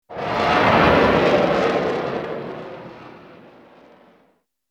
Bullet Time; FXHome; Bullet and Gun

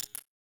Hi Perc, Data Mosh Hits; Hats - Shakers - Ride - Cymbal; 99Sounds; Mangling Audio

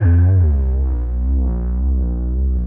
Feed chorused, Feedback FX; 99Sounds; Dark Engine

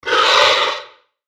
Screeching Monster; Olivier Girardot; Monster Sounds & Atmospheres

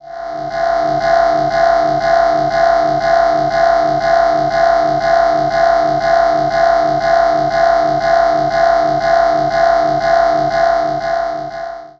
alarm, Alien Spacecraft; BlueZone; Alien Spacecraft Sound Effects

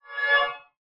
short R to L, Zwoosh; 3maze

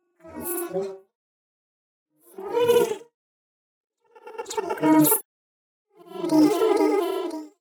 Vox; The Weird Side Samples; 99Sounds

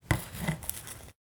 dry ice boring; Sound Design Tools; Raw Foley

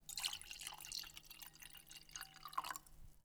pouring wine; Kitchen; 3maze

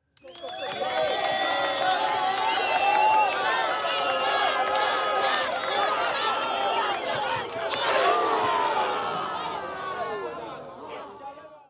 South African Soccer Crowds; TheWorkRoom Audio Post

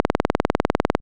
scroll, Interface Sounds; Kenney's Sound Pack

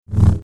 Double Bladed Saber Sound; FXHome

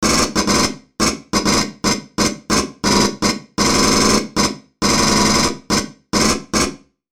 M41A Pulse Rifle; FXHome; Bullet and Gun

